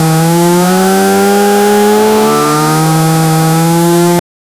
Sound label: looping sound of a runnin, race car